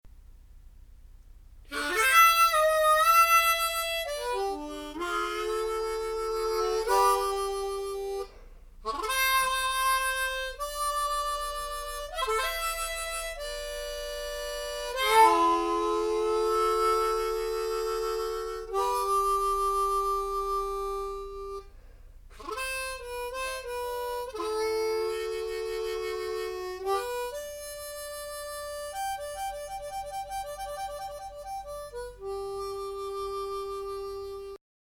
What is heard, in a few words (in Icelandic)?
munnharpa